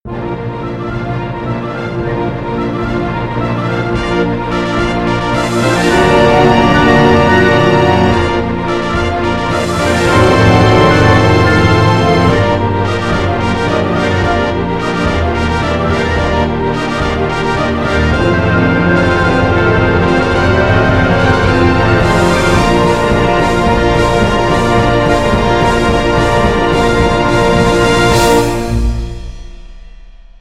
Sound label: Fanfare; Triumphant